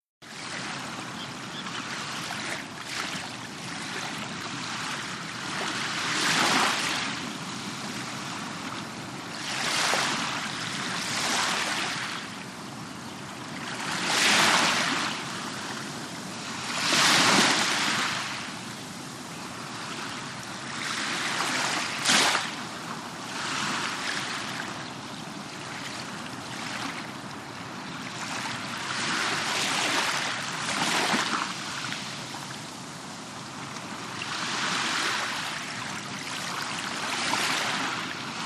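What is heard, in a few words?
Lapping Waves Close Up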